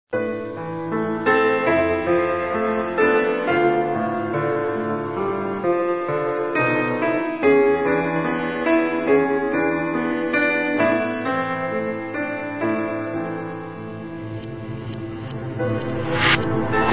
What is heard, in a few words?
instrumental